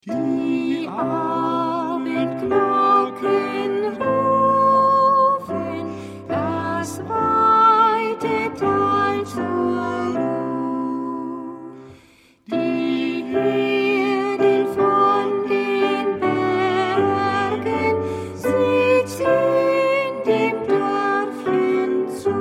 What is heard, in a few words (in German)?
Die Abendglocken; Auf den Fluegeln des Gesangs